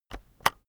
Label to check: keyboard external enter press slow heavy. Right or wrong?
Right